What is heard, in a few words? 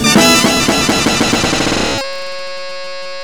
brass; Big Beat Samples & More; AKAI